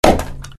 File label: wood hit; zombbieshooter